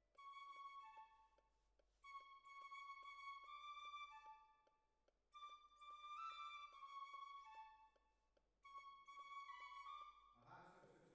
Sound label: Violins, jazz for piano